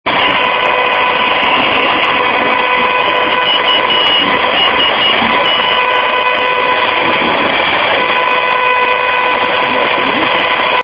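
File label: morze, Morse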